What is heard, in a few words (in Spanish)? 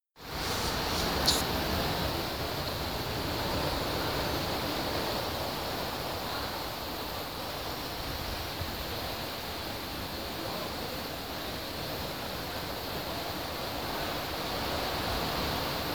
Mar quiet healing; PONDICHERRY